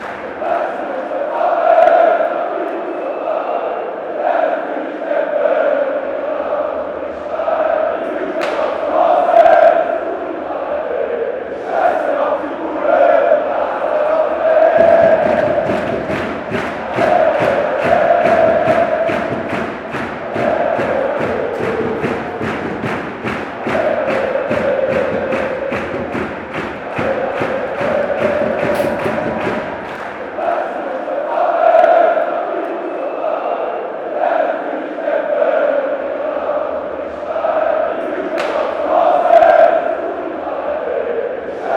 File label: rapid wien chant